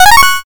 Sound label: level up